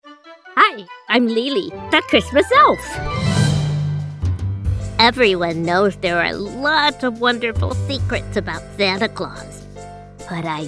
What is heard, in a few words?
Jingle w SFX Holiday Magic